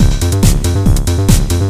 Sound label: musica